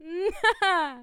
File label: achievement strong; VIVAE